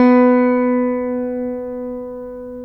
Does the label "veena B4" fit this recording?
Yes